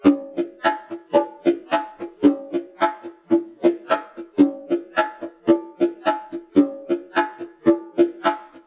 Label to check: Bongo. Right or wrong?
Right